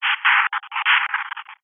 science fiction radio receiver transmission interference burst whooshing static; zapsplat